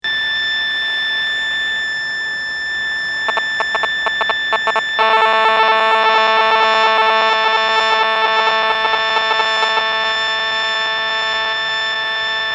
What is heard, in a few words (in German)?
handy login